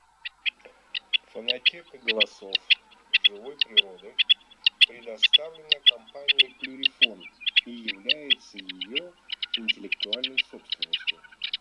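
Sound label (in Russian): Куропатка каменная Coturnice, манок; plurifon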